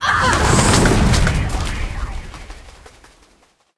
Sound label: vampire dead; monster